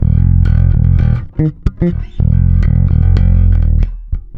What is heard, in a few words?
NASTY F, bass; Spectrasonics; Bass Legends